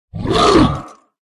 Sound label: dragon warrior attack voice up